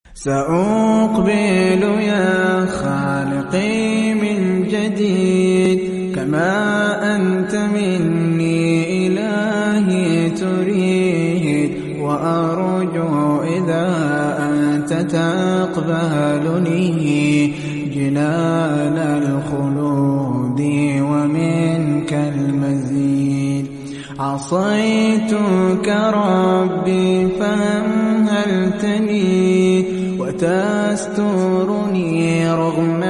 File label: nasheed, Islamic